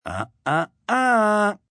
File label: Uh Uh Uuh Scold, male; Reaction; VoiceBox